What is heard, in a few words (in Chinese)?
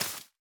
苔藓破坏声